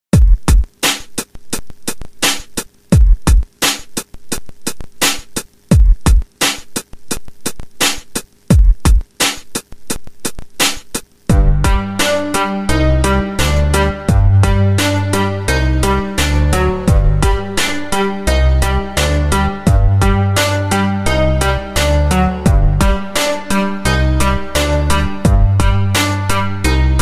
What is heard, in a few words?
Rap Instrumental